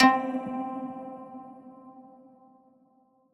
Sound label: PLUCK (C), Melodic One Shots; Bucket